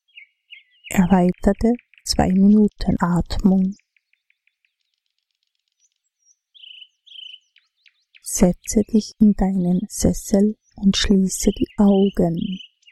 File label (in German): Meditation m Vogelgezwitscher